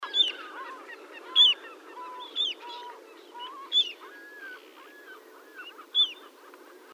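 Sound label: little ringed plover curonicus; seelhaus see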